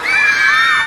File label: multiple screams